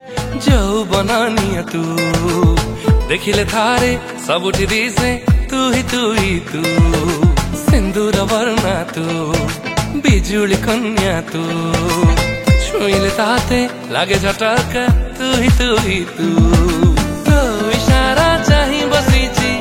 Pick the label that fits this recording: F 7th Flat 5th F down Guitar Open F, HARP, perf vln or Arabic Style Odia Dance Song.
Arabic Style Odia Dance Song